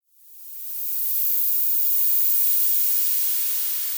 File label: Static